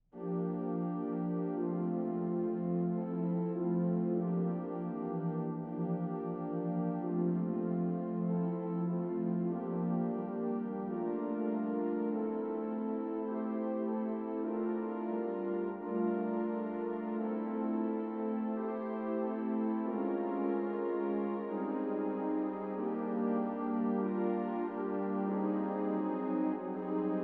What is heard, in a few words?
meditation, organist